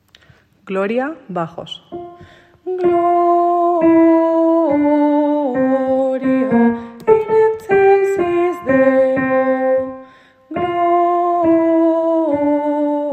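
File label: bass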